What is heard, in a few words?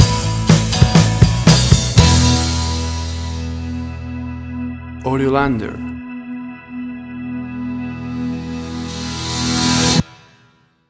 Bold and Big Indie Stinger